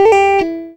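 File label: quick lick, guitar; PlatinumPhattCD2; E-MU Producer Series Vol. 8 – Platinum Phatt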